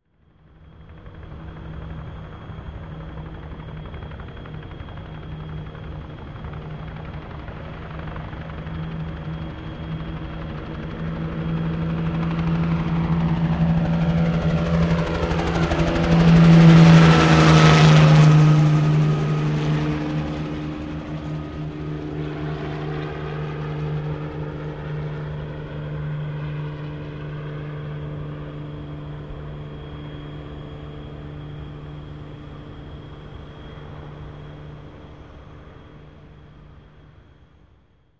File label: Helicopter flies